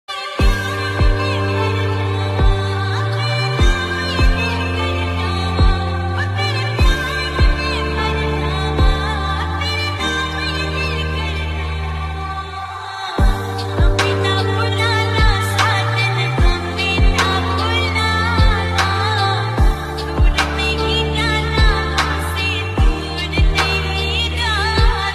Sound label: remix ringtone